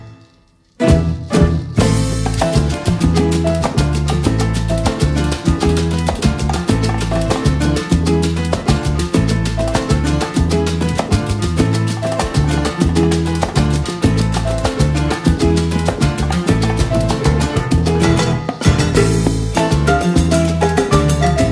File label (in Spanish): salsa instrumental version son habana